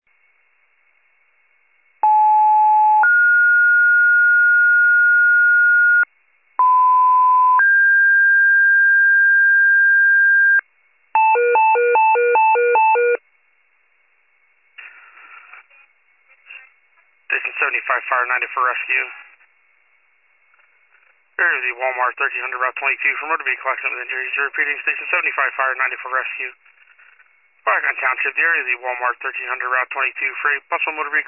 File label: fire, pager alert